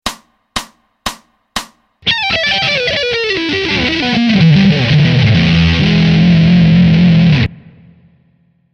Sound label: Blazing Blues Pent; fast